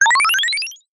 multimedia game sound classic 1 up

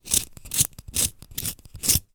office pencil sharpener manual

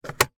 van ashtray open iveco

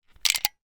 gun 1911; weapon; still north media

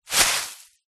cassette vhs tape massive amount loose pick up; household

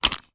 household audio cassette tape case open

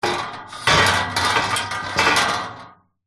metal frame bed loose hit rattle; impacts

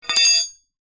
impact metal dowel thin place down on concrete

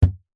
foley balloon inflated hit thud; zapsplat

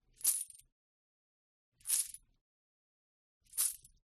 FOLEY CHAIN MAIL Armor Movement; smartsound

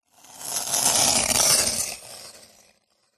sport ice skates pass by designed; zapsplat

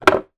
card box empty small down on table; zapsplat

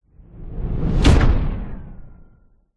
sound design cinematic hit slam powerful

